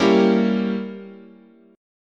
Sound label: G Diminished 7th, chord, guitar